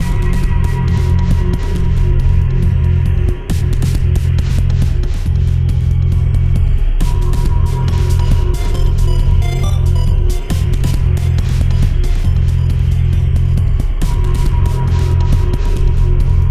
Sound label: stress music ambient loop